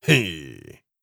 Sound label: effort grunt male